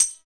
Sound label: PER TA, Percussion Tambourine